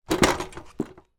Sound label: industrial sack barrow movement set down; zapsplat